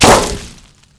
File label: bush; Mafie 1